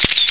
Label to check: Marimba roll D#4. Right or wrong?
Wrong